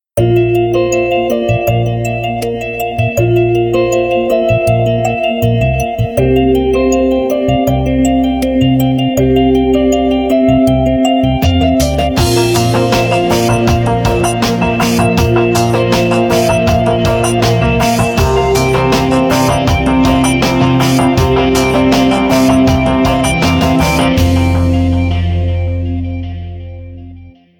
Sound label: Startup, phonetones, Nokia; Nokia E7